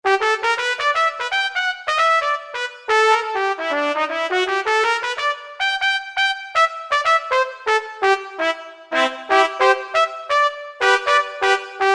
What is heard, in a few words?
Dyn Hi Brass, Kurzweil K2000; Kurzweil K2000 Value Pack; Extra Programs; BRASS1X